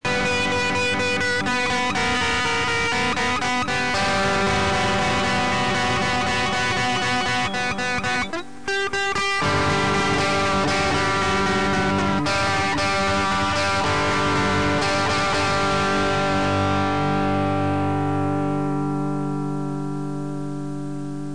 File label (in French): theme guitare electrique; Diablo 2